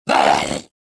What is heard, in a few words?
choke